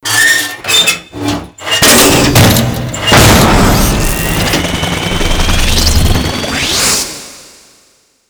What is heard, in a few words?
Drone Disconnect Fly Away End; Warframe